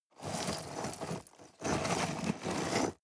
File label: scrape rocks soft